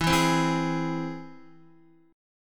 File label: E Augmented, Guitar; E+ strummed; JGuitar